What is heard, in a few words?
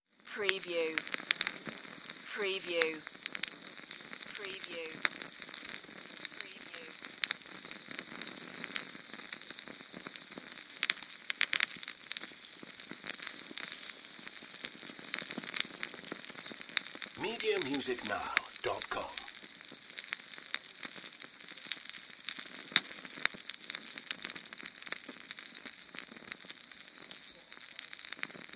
Sterno Flame Small